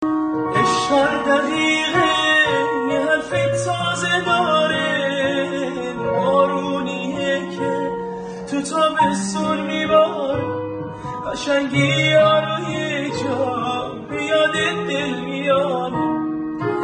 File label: Piano